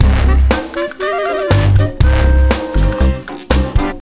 Flourish; gamerip; Happy Feet Two; nds

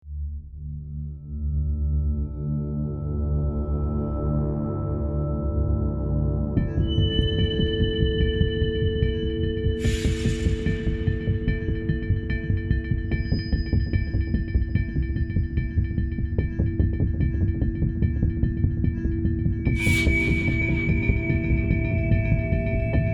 Action Loop

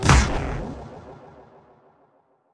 transition to menu boom

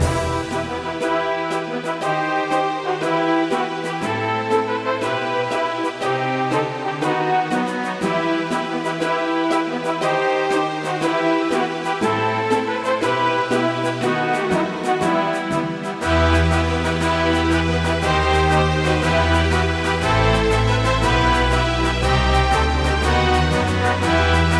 Combat; Battlegrounds

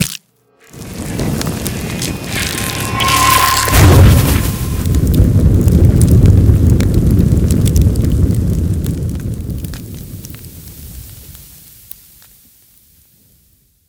Egg Shrine Destruction; Devour; The Inn; devour 2021 gamerip pc macos

